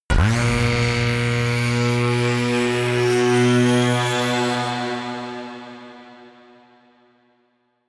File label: reverb rumble, risers